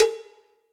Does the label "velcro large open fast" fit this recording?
No